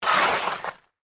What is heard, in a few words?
Shovel Scoop Mud; Yard Tools (Sound Effects:Tools); BlastWave